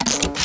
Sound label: ambience disaster ignite